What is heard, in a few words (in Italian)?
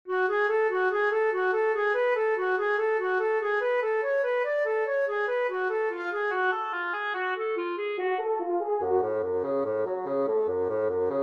quintetto per fiati